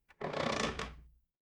door creak

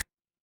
ui click

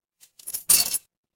glass shatter

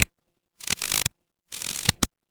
fire electric spark

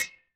glass clink

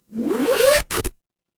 paper fabric zipper

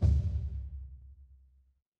transition braam

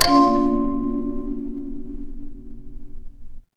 instrument kalimba

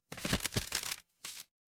paper fabric crumple

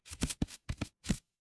paper fabric card shuffle

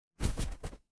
paper fabric fabric

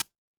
ui tick

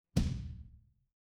transition impact hit

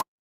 cartoon pop

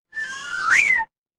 cartoon slide whistle

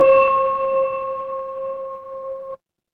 instrument singing bowl

